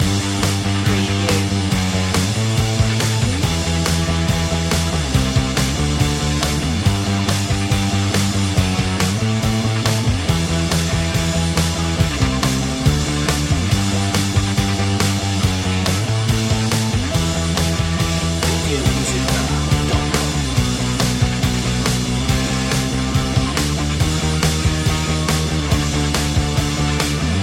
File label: intense rock loop